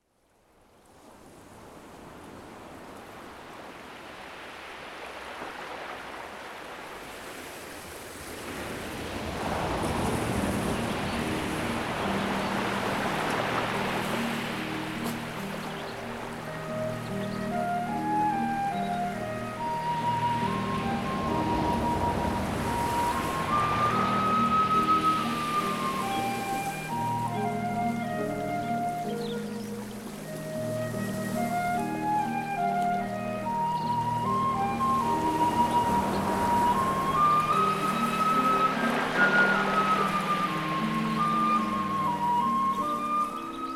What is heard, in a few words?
pastoral relaxing intensive